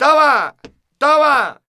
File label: VOC, Flamenco, male; Best Service ProSamples vol.46; AKAI